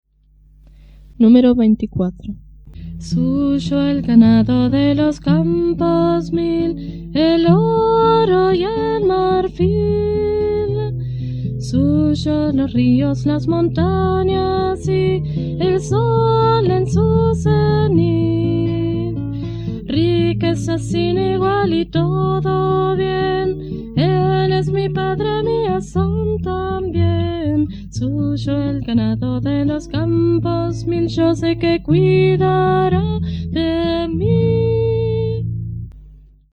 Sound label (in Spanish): Coro, himnario niños